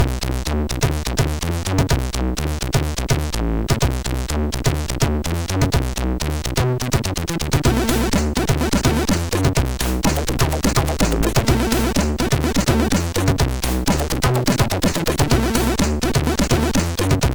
Disco, sid MOS6581R3; hvsc

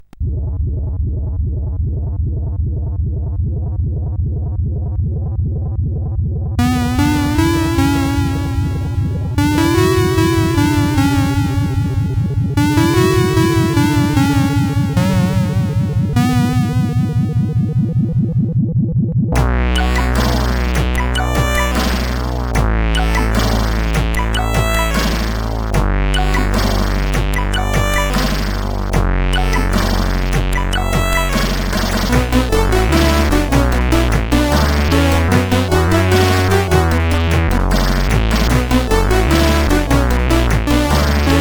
sid CSG8580

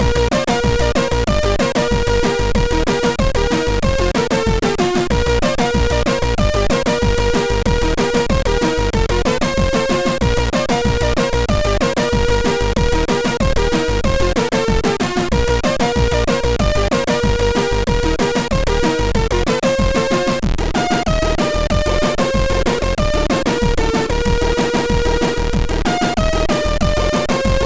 Singing Cows tune, sid MOS6581R3

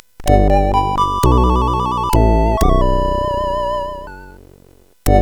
Jingles, CSG8580; hvsc

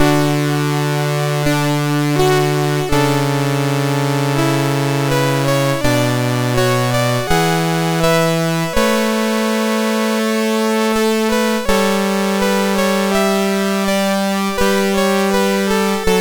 Anthem, MOS6581R3

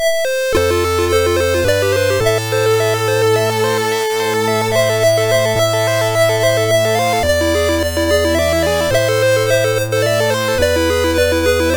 Slow and Sad Intro, MOS6581R2

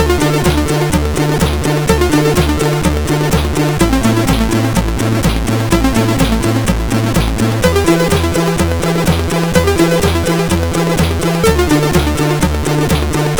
Intro CSG8580R5; Defbeat Collection 2; hvsc

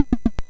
Mini Golf, MOS6581R4

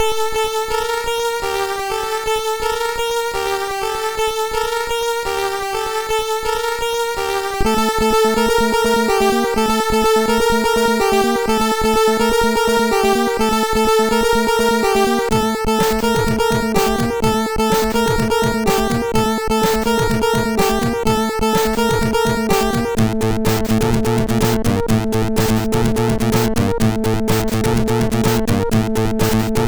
Techno, MOS6581R2

